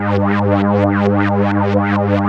Korg PS3100 G 3; Back In Time Records; Korg Universe VOL-1

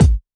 OSH Kick

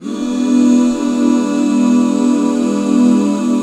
E 7; Best Service Gigapack 1

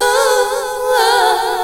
VOX SUNG; Megabass Remix! 2

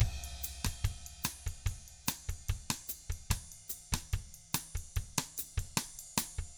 BOSSA, Drums; ILIO; Double Platinum Drums 1

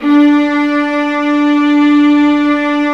Violas Bright, String Sections; Roland LCDP13

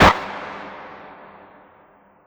PERC L, Drum & Bass 160 BPM; Ueberschall; Drum & Bass Flash Trackz

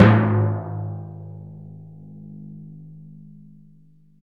PRC TIMPAN, Timp Roll Dry; Roland LCDP03

